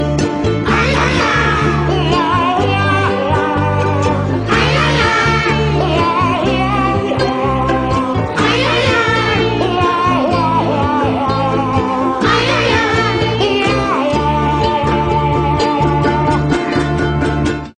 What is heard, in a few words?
Ukulele orchestra